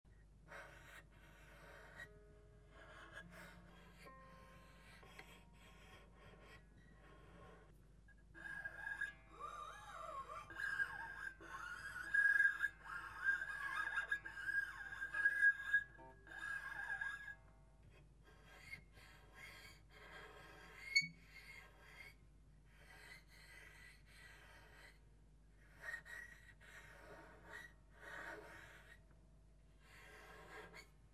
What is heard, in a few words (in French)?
gliss doigt, harpe